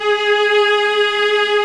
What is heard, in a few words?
ARCO VIOLAS; OMI - Universe of Sounds